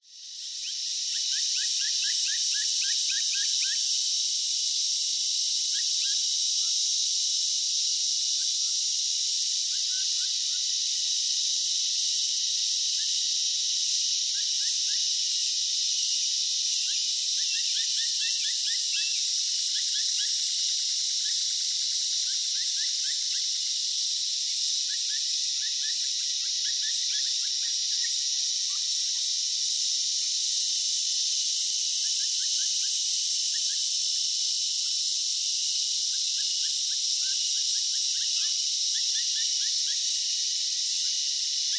nature rural ambience australia bush summer insects birds midday; zapsplat